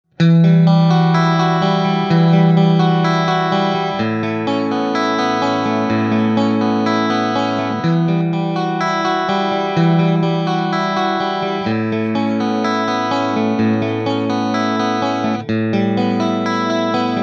Clean Mid Bridge Single

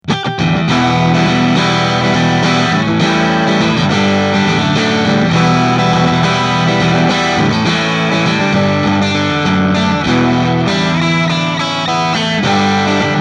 Crunch Mid

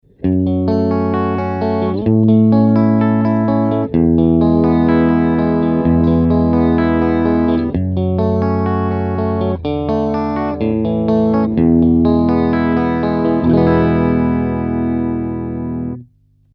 Strat Am Pro arpegio puente medio

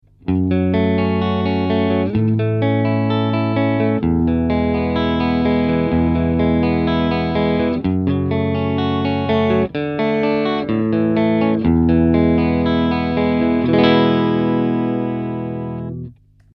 Strat Am Pro arpegio medio

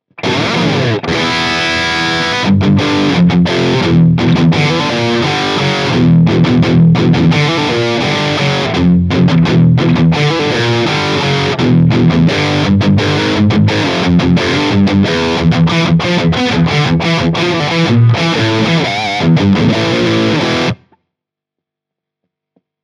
Relay dist

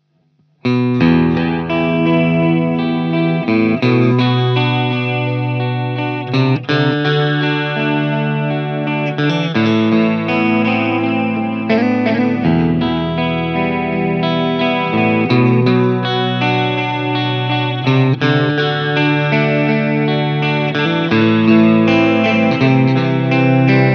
hx Mod echo delay

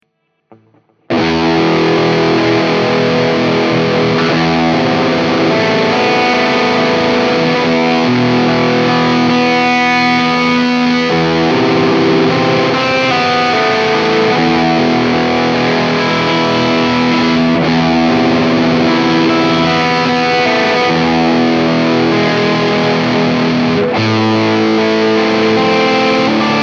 Space chords

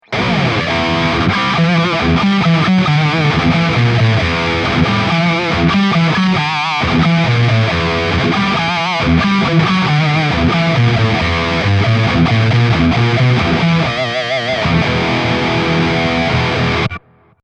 Metal Bridge

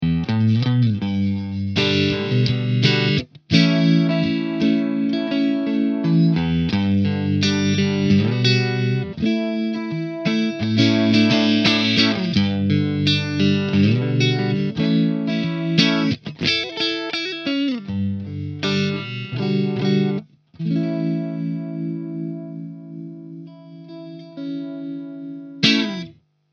hx 60s trem

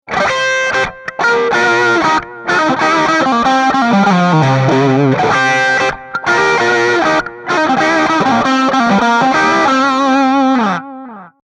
Licks Bridge

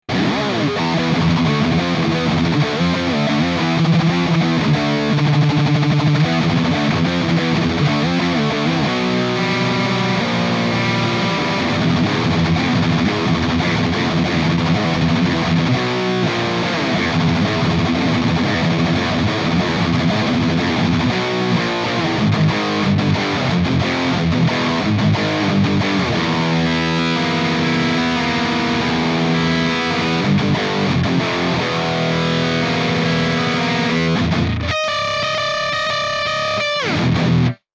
Solar guitar Thrash

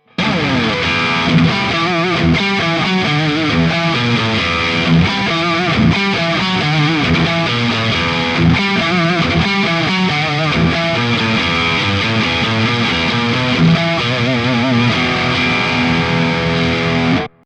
Metal Neck

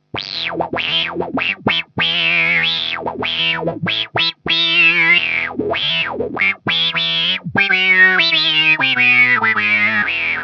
hx synth

